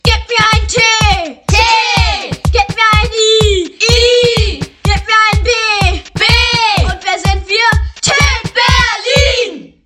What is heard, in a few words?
Club Sound